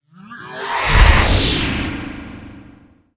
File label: harpyslave attack, monster